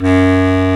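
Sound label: bass clarinet, Orchestral Winds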